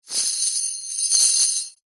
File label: industrial chain small set down concrete